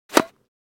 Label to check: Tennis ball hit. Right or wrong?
Right